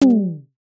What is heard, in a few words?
bamboo knock